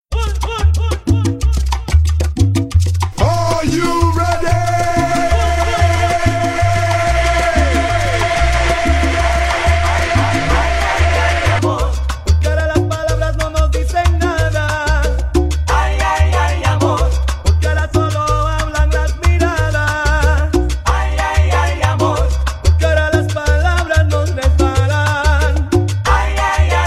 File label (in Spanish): SALSA